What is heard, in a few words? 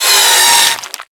Miter Flesh Impact; Warframe; Tenno; Hit Effects